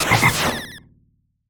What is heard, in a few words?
slash